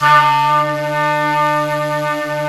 Flute, Singin' Flute

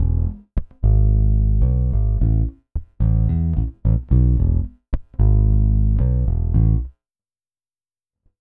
Pop, Bass

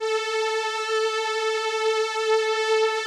VP-330, String Machines

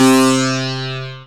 RESO MID, SYNTH FUNK; Zero-G; Funky Elements

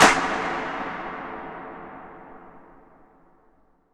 IMP SNR DRUM, Drum, Cinematic Impact

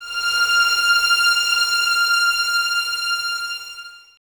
VNS F 6, Violins, String Ensembles; Miroslav Vitous

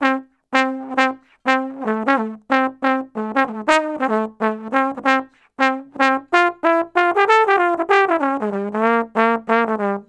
Trombone Solo, Jazz; Sonic Foundry (Sony Creative Software); Jazz Solos and Sections

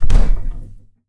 Fridge Door, household rhythm samples Hits

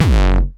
909 Grime Layer F#1, Kicks; Drums; Mbase 11 Kicks

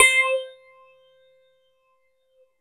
JV phase, JV-1080; Syntec; Wall of Sounds

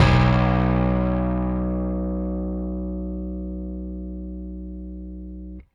Rickenbacker Bass; Airwindows

